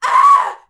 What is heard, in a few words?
girly die; female characters; AoM-ARTofMyth